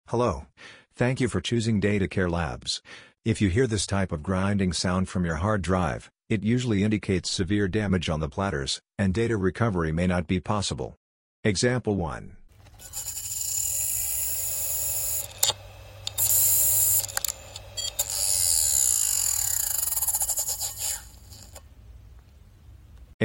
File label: Hard Drive Grinding Sounds; hard disk data recovery; Team DataCare Labs INDIA